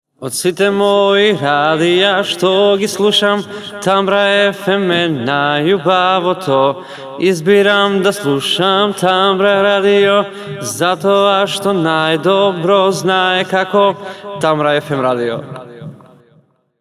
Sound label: Jingle; Europa